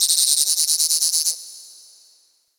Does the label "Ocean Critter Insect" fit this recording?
Yes